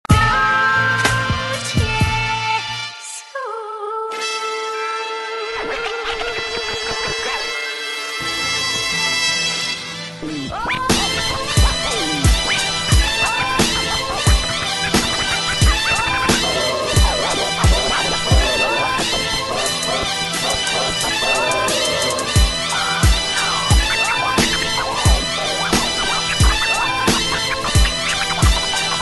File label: beat skit